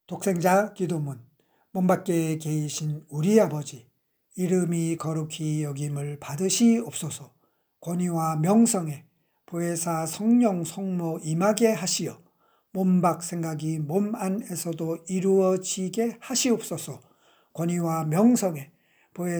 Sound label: chanting